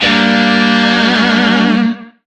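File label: Shawzin Minor Chord; Music; DE; Warframe; Lizzie Shawzin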